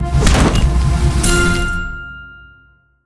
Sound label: Winstar 1 Star; Angry Birds